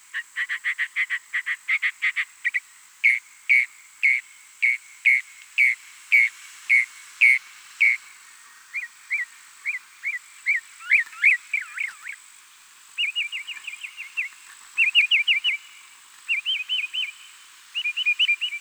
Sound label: Adult male imitates bluejay tufted titmouse bald eagle